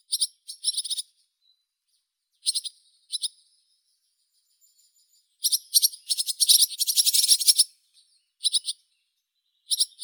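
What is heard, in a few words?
barn swallow, Hirundo rustica; lastochka derevenskaya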